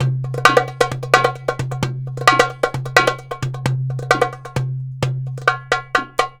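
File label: DARB, Oriental; Best Service; Ethnic Journey Sound Cube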